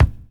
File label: BD SKIN; E-Lab; X Static Goldmine 2; akai